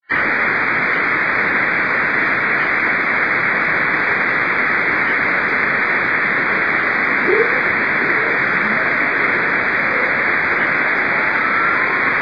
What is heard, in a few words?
10G jt65c airplane; Beacon